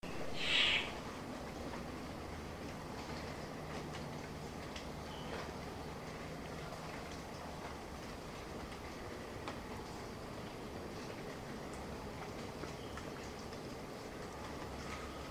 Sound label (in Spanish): canto ave del paraiso victoria